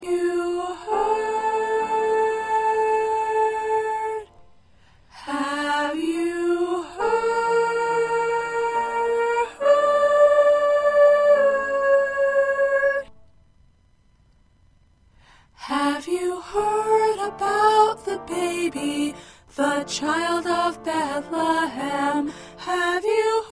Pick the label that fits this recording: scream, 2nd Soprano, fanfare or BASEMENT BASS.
2nd Soprano